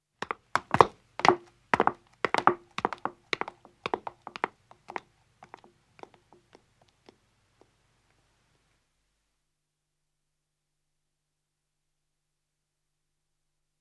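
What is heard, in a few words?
Footstep Stone Descending, 2 women depart; BBC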